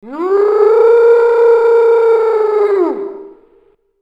Aliens Monsters; FXHome

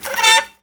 metal screech; Sound Design Tools; Raw Foley

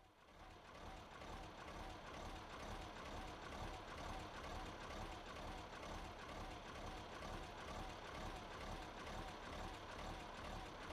Fire Engine, Testing Electronic S; Emergency; BBC Sound Effects Library

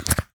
yogurt; Sound Design Tools; Raw Foley

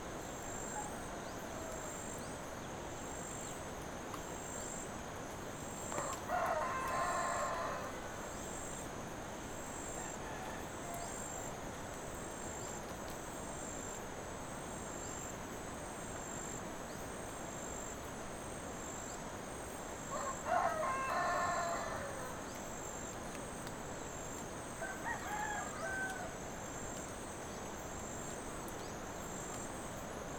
Mid-Day Animals, Ambience - Banaue Rice Terraces; InspectorJ

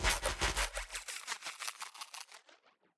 Designed Ice - Rhythmic crack impact; Gregor Quendel

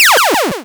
fall, Retro Sounds; Kenney's Sound Pack; Retro Sounds 2

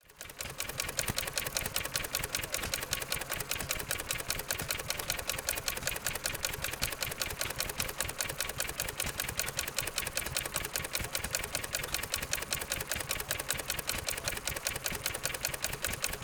fast chugging, Early Gasoline Engines; wico; JC Sound

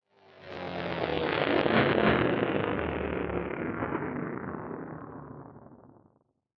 Movement; 99Sounds; InterSpace

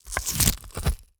Attack Gore Flesh Rip; Sound Spark LLC

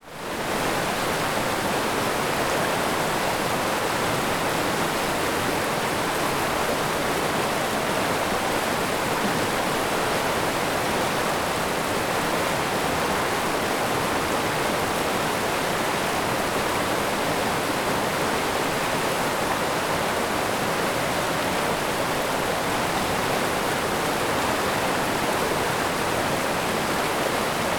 Rapid large river flow (distant perspective); Sound Ex Machina; Water Flow

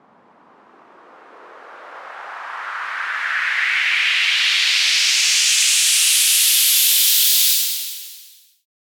Short Sweep, White Noise

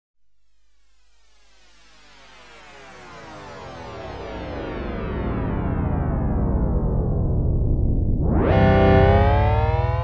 Space Fantasy, Beaming Down; BBC Sound Effects Library

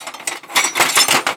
metal various

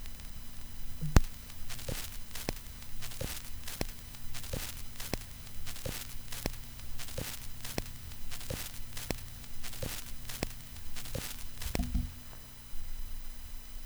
vinyl crackle; 99Sounds; Vinyl Noise SFX

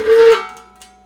yelling metal; Sound Design Tools; Raw Foley

